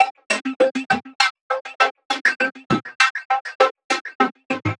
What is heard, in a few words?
synth 100 sharp fuzz C; Synth Loops; Transmission-X